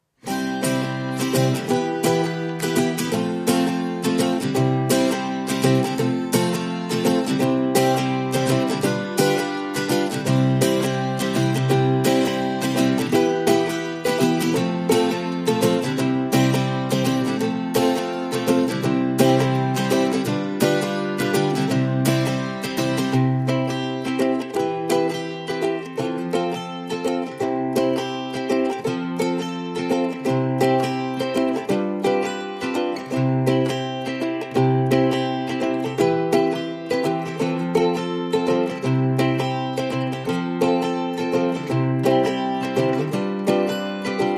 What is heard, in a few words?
Guitars and Ukulele Rhythm Only